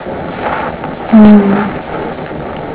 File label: moan; haunted places; archers pond